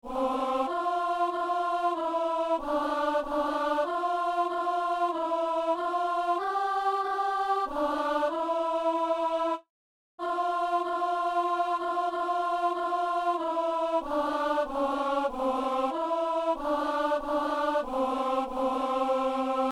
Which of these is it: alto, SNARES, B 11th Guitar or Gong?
alto